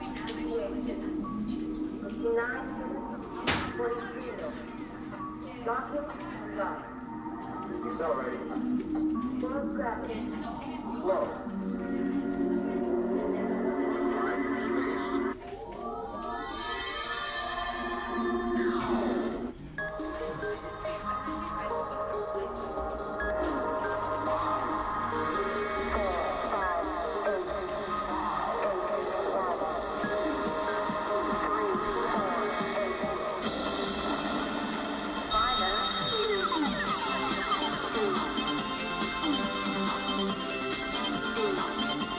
japanese techno

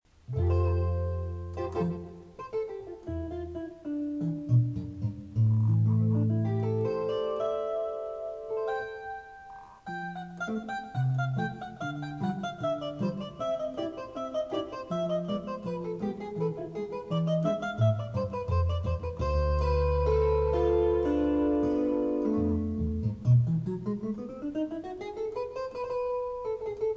guitar crying

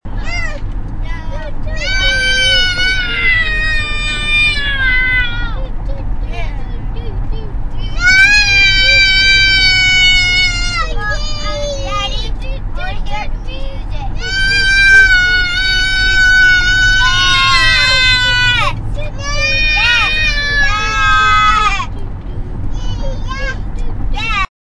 kids in the car fun

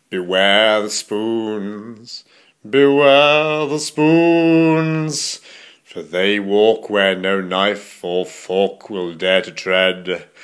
spoons

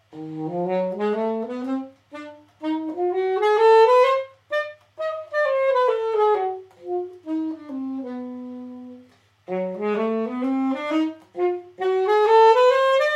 saxophone

